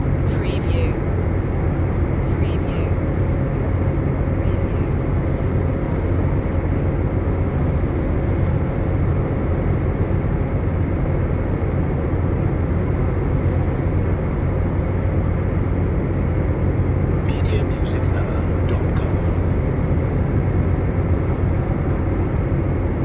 ferry ride ambience loop

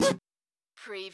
CARTOON SQUEAK

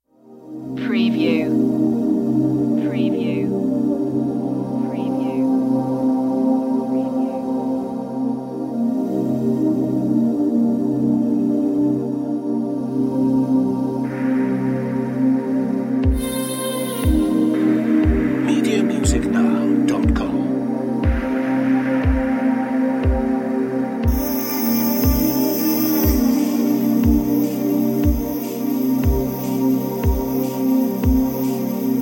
downtempo sunset loop intro

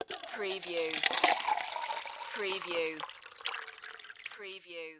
Water Pour Liquid; Sound Effects Bible

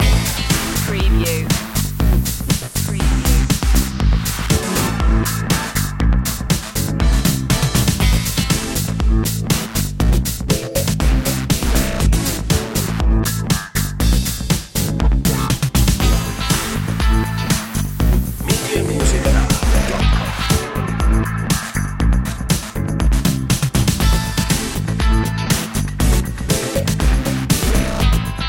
party train loop

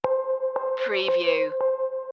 climb a mountain stinger

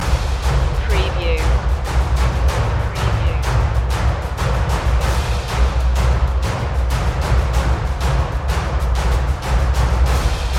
perc loop

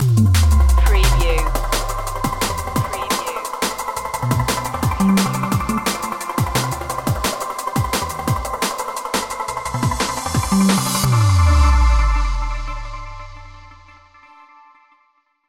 drum n space stinger

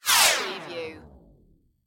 Laser Hit Descend

